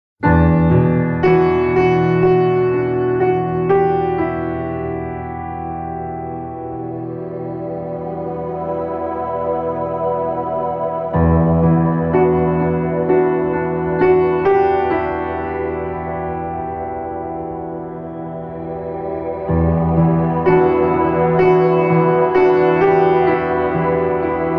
piano and ambience

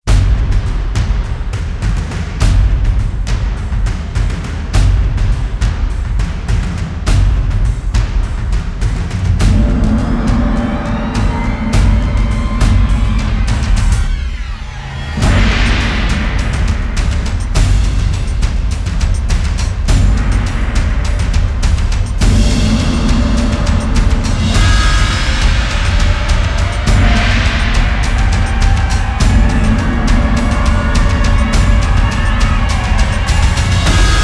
drums fx

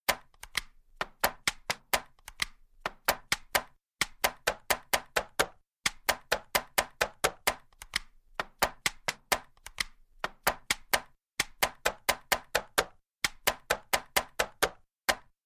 clap snap pop

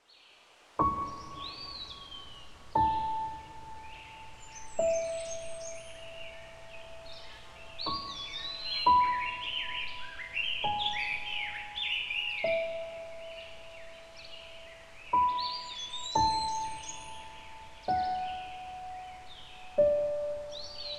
piano bird song

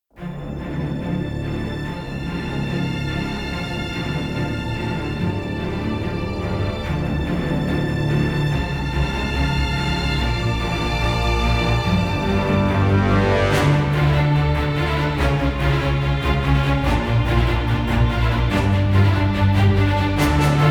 no choir no percussion

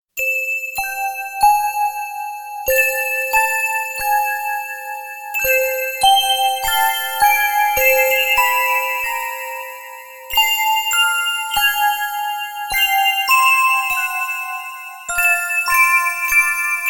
jingle bells instrumental

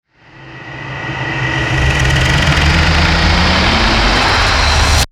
extended ramp; Ninja Tracks

